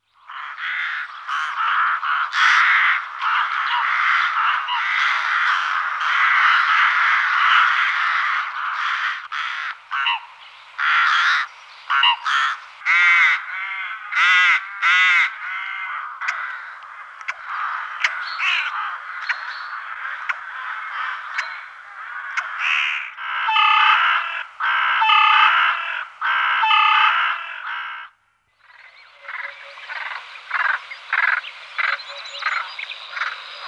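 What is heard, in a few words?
Corvus frugilegus, passeriformes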